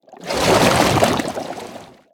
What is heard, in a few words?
Shimmering Sunfish Attack